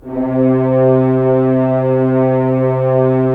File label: Horns; Roland - LA Composer vol. 4